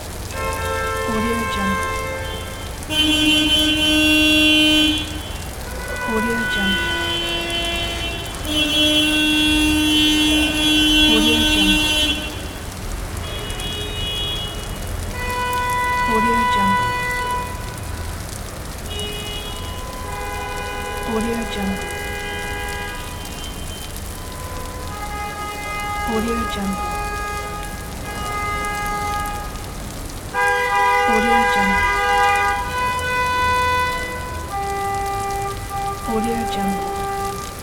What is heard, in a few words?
rush hour cars honking in rain